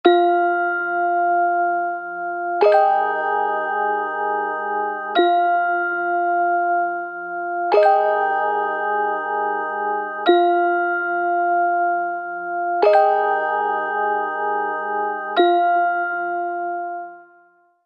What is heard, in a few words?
ringtone classic; The Light Phone II